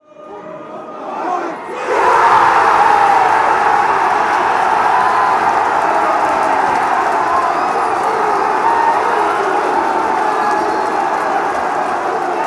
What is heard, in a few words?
fanchants